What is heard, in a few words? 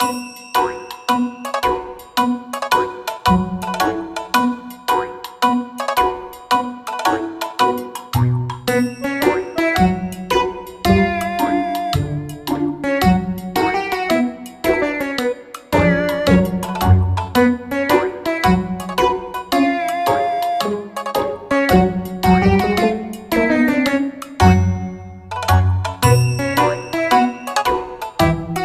bon odori; Yo-kai Watch 3; 3DS; bonodori2